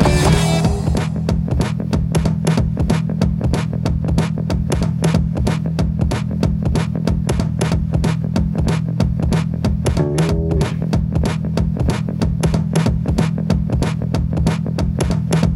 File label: game loop